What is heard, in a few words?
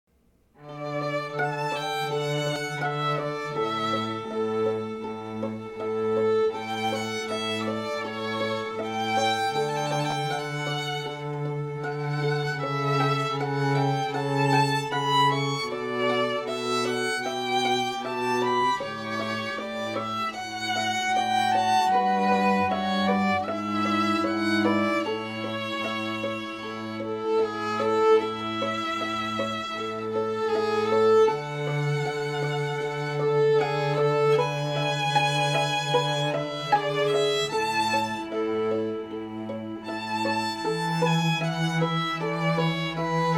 String Trio